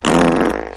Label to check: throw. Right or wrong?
Wrong